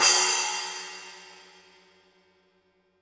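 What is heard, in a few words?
Cymbal, Real Drum Kit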